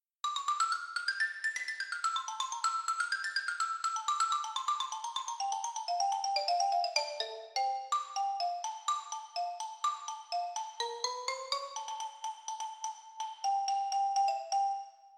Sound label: mallets tryout